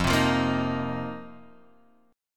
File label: F 7th Suspended 4th Guitar Half Step Down, chord